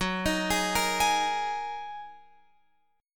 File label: Gb 6th Add 9th Gb down Guitar Open F; Listen to Gb6add9 arpeggiated